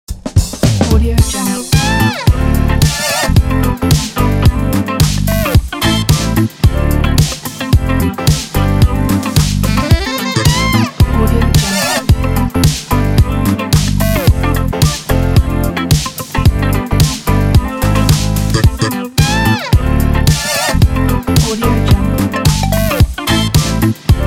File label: uplift pop funk